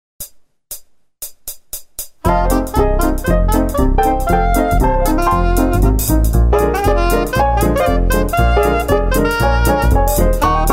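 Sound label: Jazz melody; MyVirtualBand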